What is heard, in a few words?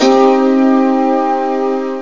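standing ovation minor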